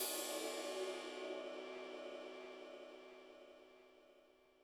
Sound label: HiHat & Crash, Roland TD-20; Crashes; HiHats